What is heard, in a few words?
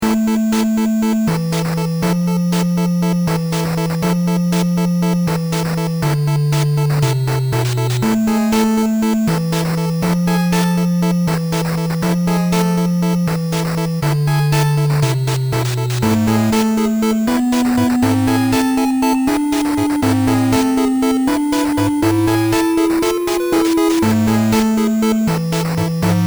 8-bit; Lenna's Inception; Into The Jungle